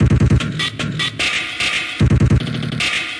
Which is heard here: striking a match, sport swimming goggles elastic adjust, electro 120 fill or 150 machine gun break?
150 machine gun break